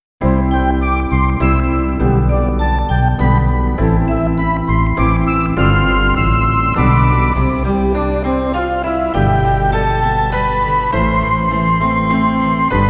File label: Instrumental